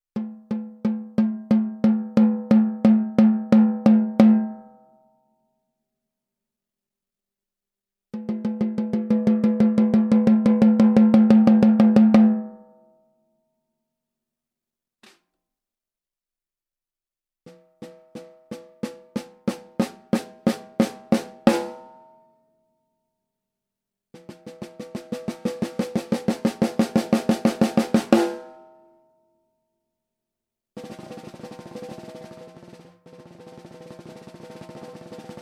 14x65 Oak Snare Recordings Mittel

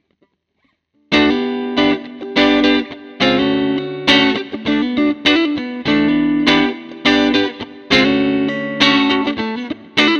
Digitale Gitarrensounds Fender 53 Bassman

